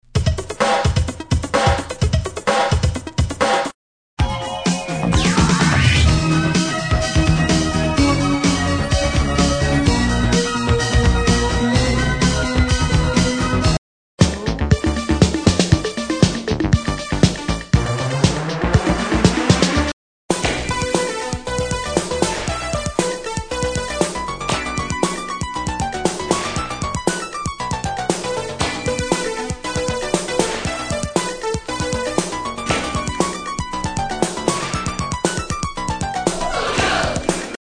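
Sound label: keyboard rhythm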